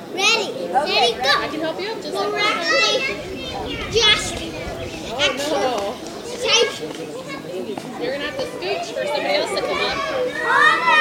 elementary; Golden Crayon Sounds

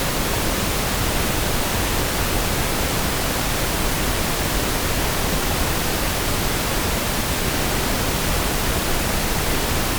Pink Noise; iOS 26 Sounds

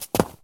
concrete land; Golden Crayon Sounds

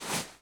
jump; Original TK Sounds

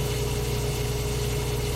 lift; Golden Crayon Sounds

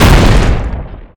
wall boulder; Golden Crayon Sounds

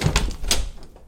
door open; Golden Crayon Sounds

